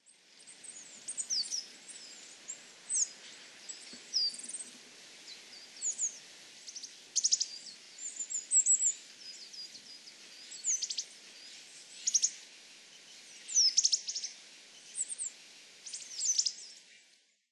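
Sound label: higara, Coal Tit; chichibu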